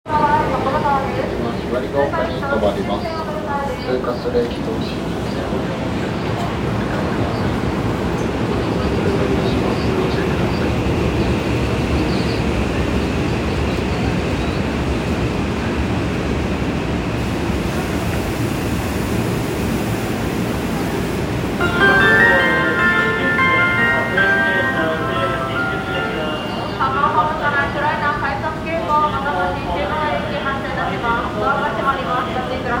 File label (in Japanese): 西武池袋線所沢東, train sounds